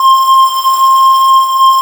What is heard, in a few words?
FUZZ PIPE; Spectrasonic Distorted Reality 1; FUZZY PIPE